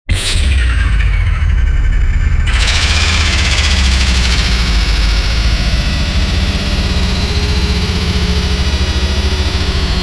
evil robot power on scifi

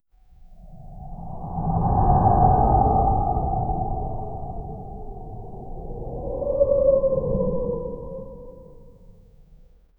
lion roar soft

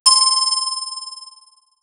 in mario when coin is, video games

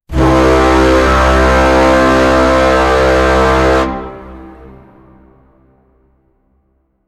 very heave dramatic horn

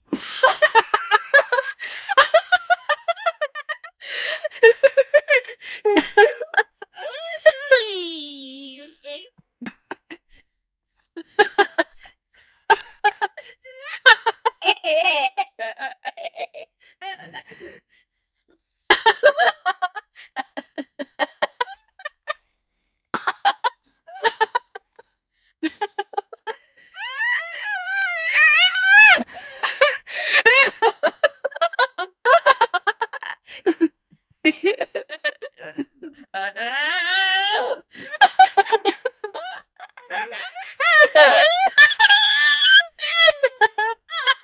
a kpop female star record, studio

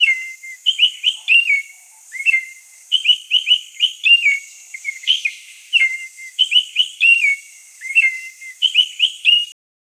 rain forest tropical bird

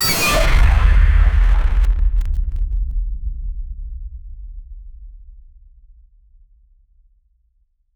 low frequency energy blas; cybernetic

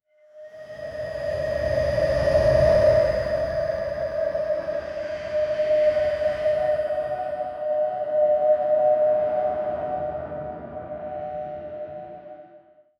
atmosphere

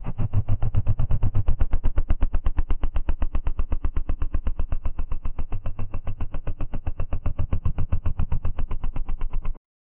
trex under jaw inflated, reptile

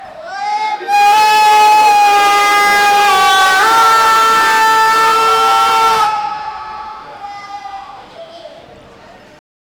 a large crowd of japanese; high volume

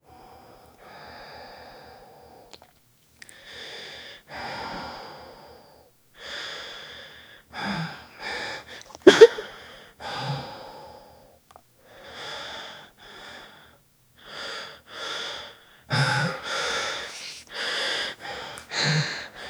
18 years old man holding

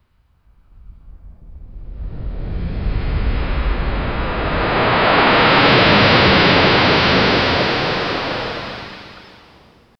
huge ocean wave crashing, boom

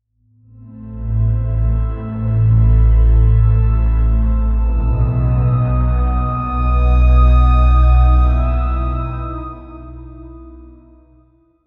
halloween theme for spook

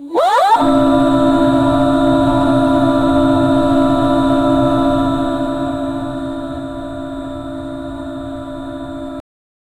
electronic strong super c, choir

